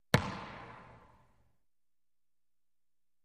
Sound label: Racquetball Bounces